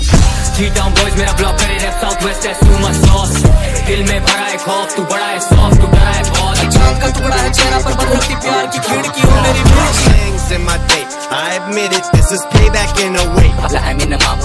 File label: RAP MEGAMIX